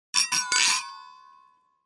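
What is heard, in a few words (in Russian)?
glasses moved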